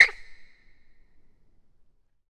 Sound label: Basketball Court